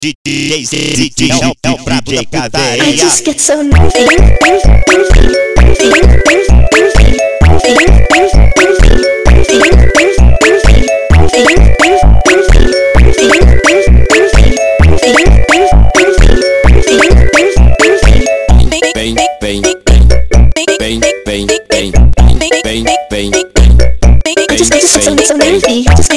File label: Funk